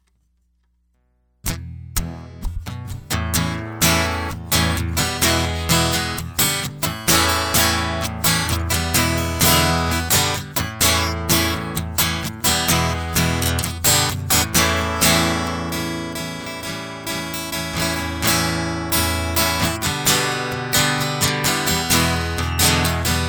Guitar Loop

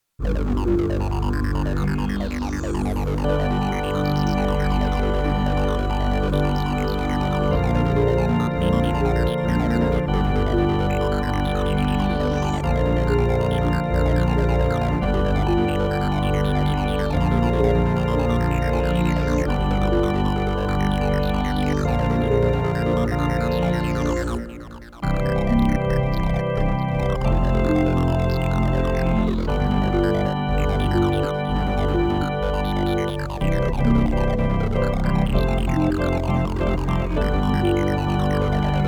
space prelude

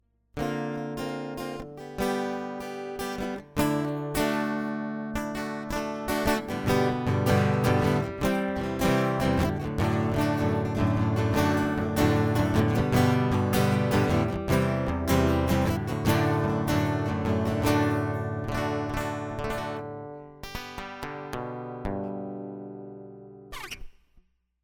guitar prelude; MegaEpix Enormous

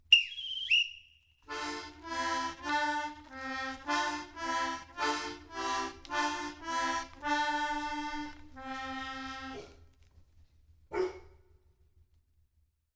carnival accordion; The Thrill of a Lifetime

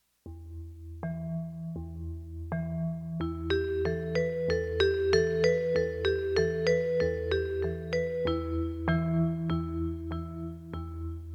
vibraphone

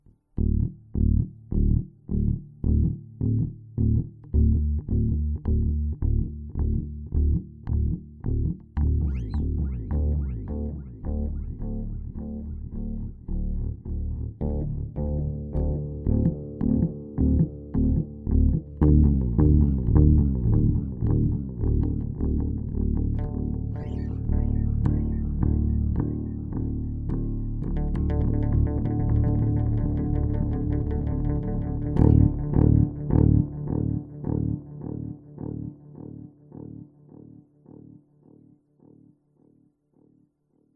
bass; space prelude; MegaEpix

